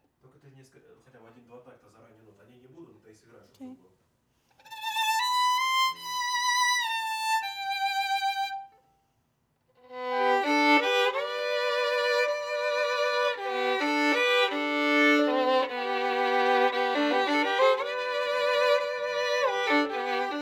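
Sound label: Viola C minor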